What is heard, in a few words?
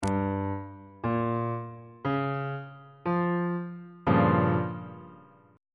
a sharp minor 6th 3rd inversion